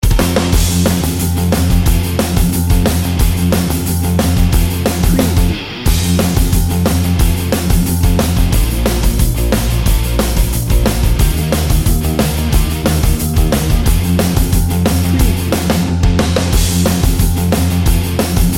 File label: pop rock party bed